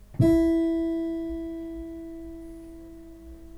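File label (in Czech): cembalo d dur, harpsichord D major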